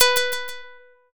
shamisen

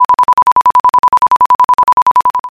trainbell

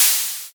open hat

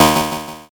zap

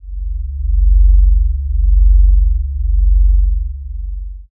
space drone

